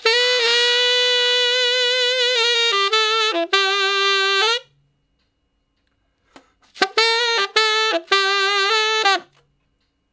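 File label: Tenor Sax Shuffle (G); Crimson Blue and Fabulous Horncraft 4 RnB; Horncraft for R&B; Solos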